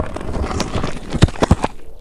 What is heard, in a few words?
impact stone